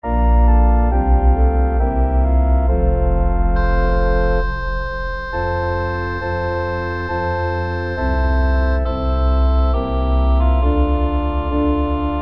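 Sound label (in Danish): Largo, orgelkoraler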